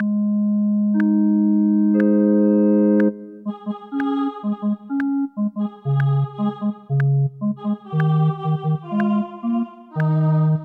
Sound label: O clap your hands; Gibbons